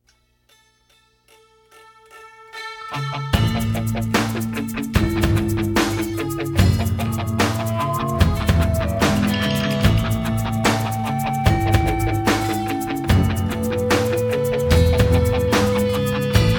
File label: Instrumental